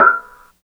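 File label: 97 MOD HAT, hi-hat; Phat & Phunky; East West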